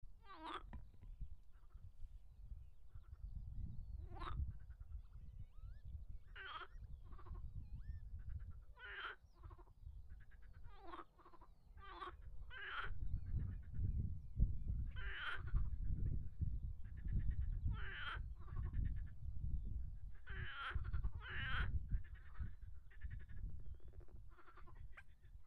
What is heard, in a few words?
marsh frog